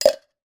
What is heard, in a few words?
can soup empty spoon drop inside; zapsplat